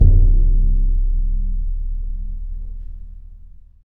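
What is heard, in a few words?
orchestral bass drum; Orch Bs Drum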